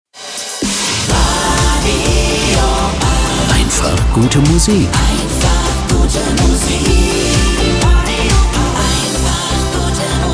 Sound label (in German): Opener Good Time LANG; RADIO 8